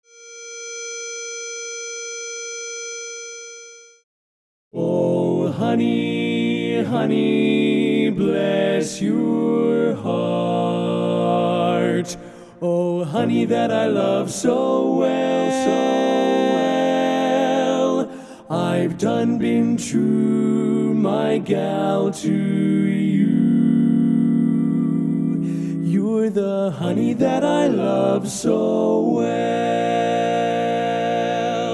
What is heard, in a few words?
Medley Lead, Learning Tracks; Barberpole Cat I